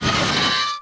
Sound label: Scrape; PC Gamer IT CD 49; UrbanChaos